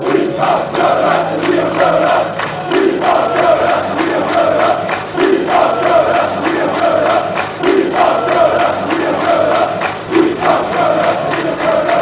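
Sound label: we are going up, chants; reading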